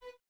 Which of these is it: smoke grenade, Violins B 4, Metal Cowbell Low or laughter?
Violins B 4